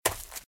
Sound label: Footsteps - Leaves - Run - Left; RadwinIsland